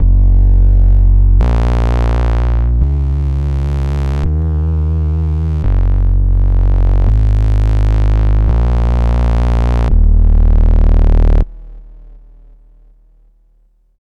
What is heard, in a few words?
Up Filter Bass, Drum n Bass; KJ Sawka; Drum n Bass & Beyond